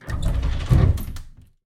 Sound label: door open; Golden Crayon Sounds